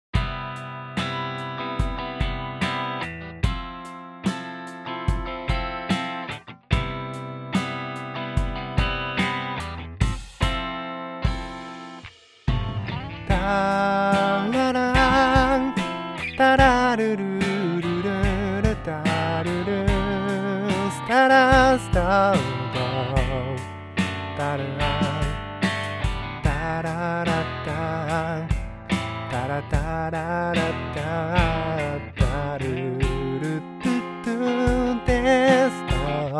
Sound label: drum